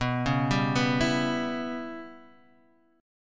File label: C sharp Minor 7th Flat 5th B